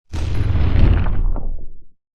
Explosion Low Fire Destruction - Short Passing; Hollywood Edge; sound designer's toolkit v2

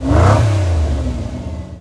Aston Martin Rev, Automobiles

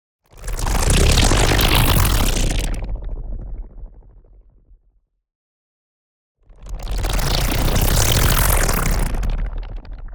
NEON WHOOSH LARGE SLOW Stuttered Particles; boom library

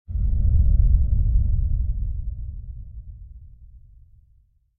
Impact Underground Distant Muted Hit; Hollywood Edge; sound designer's toolkit v2

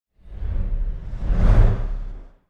Whoosh Deep Hover Heavy Bass; Hollywood Edge; sound designer's toolkit v2

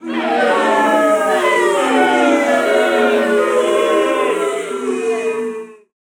Crowd Boo; Pitch Black Audio Pong

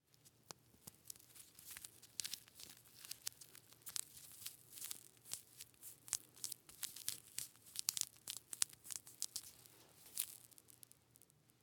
Bandage Wound; Medical; Blastwave

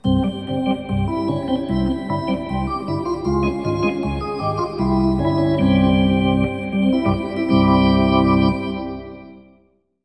Hockey Organ

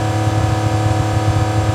Aston Martin Loop, Automobiles

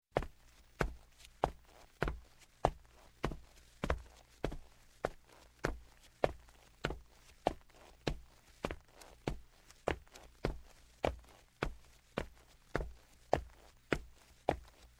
Footsteps, Wood Sneakers, Slow; Sound Ideas 2000 Series

